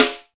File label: original snare g sharp key; snare drum samples